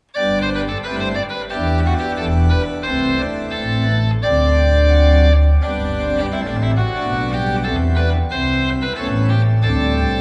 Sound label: Trumpet Tune